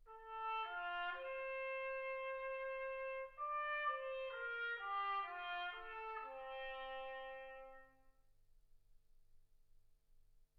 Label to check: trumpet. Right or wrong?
Right